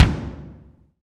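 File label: bass drum, Roland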